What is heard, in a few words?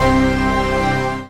dance chord